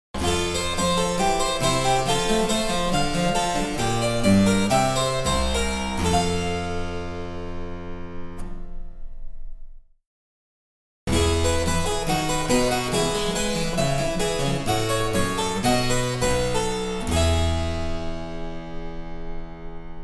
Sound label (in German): Cembalo; Mark Lindley WTC 1